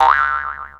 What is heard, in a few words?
boing